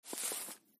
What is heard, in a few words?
clear cards